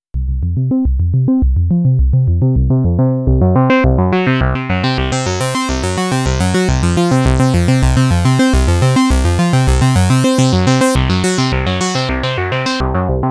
Vermona 14 Arpeggiator

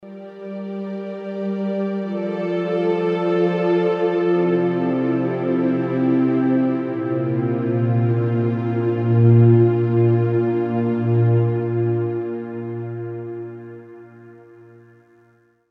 Studio Strings Momentum

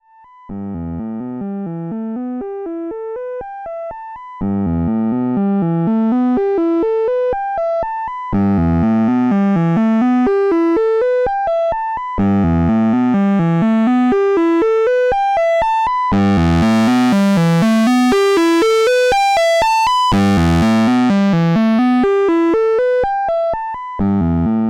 vcf1 Drive LP 50 Gain sweep

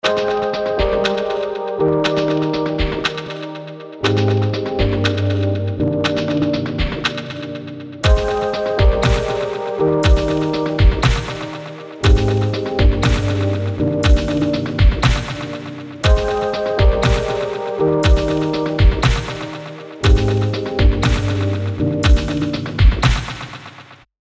Electronic Grooves Dance Big Compression Hit

